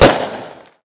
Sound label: Pistol Shot